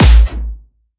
Distortion Kicks, Bassdrums

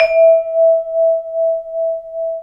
VIBRAPHON; ILIO

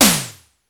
ELEC.DRUMS; Crypton - DrumBase5000

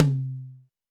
Tom Mid Dbl, Alesis D4 drum machine

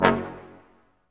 BRS Quintet stac; Roland L-CD702

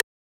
Side Stick, Yamaha DJX 2B

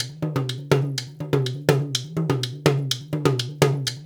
loop, percussion

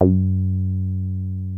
303 F#2, TB 303

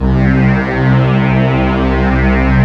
Prophet Five

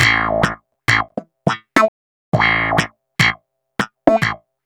Wah Snap In A, Snap Bass; Basses

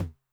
Mid Tom Noise, 909; classic machines; MT - MID TOM; 909mod

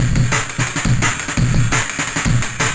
175, Breaks; EZRollers

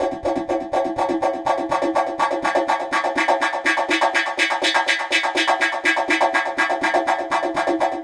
Echo Chuff, Synthetic Pulses

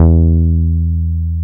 BAS MKS-50; Best Service Gigapack 2

